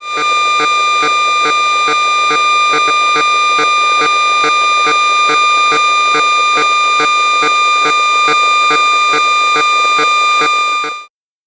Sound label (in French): Antenne relais telephonie mobile echantillon; Antenne relais téléphonie mobile - échantillon 2; Ondes électromagnétiques et électromagnétisme